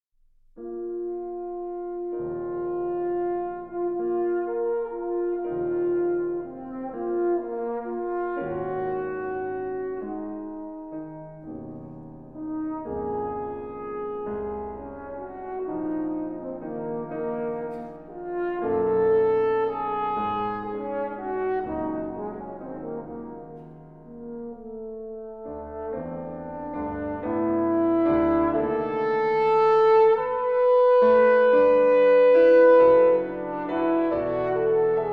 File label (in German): Sonate für Althorn und Klavier